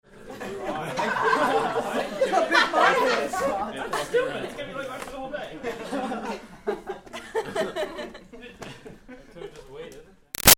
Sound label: Crowd High School Class Laugh Reactions; Schools